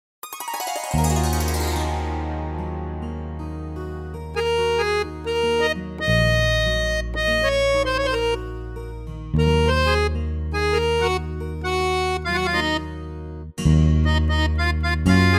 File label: Karaoke